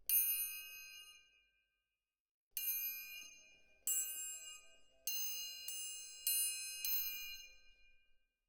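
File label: Percussion, Jazz for piano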